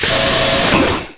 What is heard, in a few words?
door cls; environment; doors